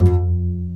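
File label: String, contrabass pizzicato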